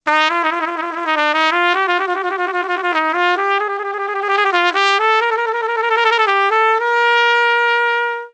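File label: cornet fx; Musical Instruments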